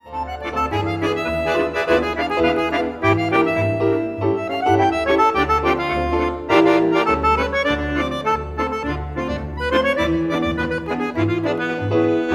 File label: Bossa